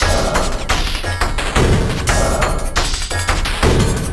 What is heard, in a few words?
174 QUAR FX3, Industrial Loops